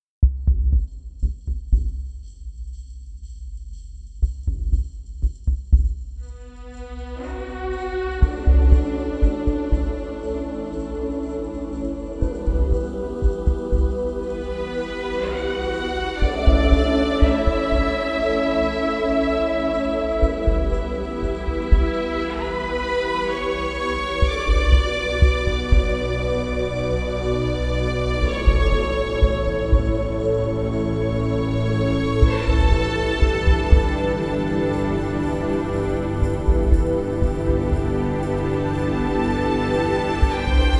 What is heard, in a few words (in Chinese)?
背景音乐